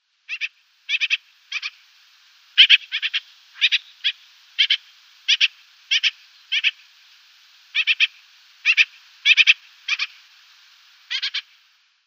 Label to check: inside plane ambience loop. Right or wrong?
Wrong